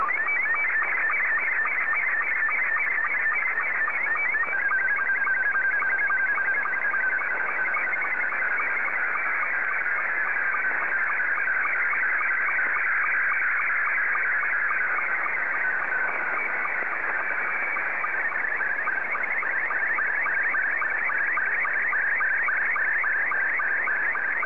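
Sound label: SSTV, Scottie 2; JA3QJQ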